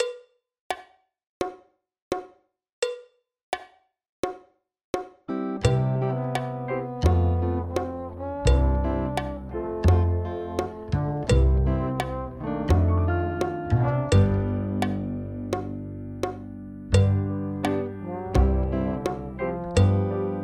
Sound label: Drum Kit; Pre-Grade Higher; backing tracks; MTB Exams; MTB Drums Pre-Grade Higher Handbook